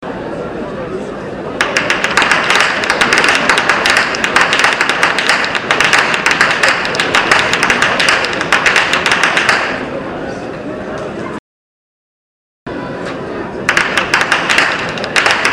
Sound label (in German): schnalzen, jugend; leustetten